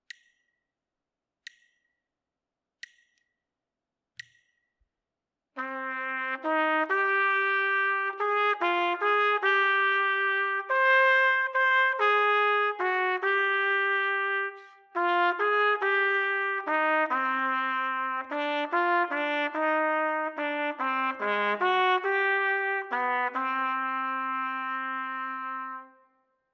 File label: melodic dictation